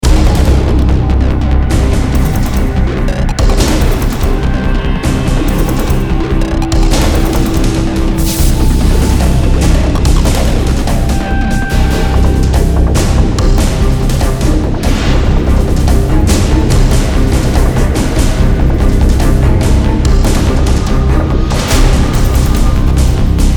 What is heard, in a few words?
music battle; freelancer-hd-edition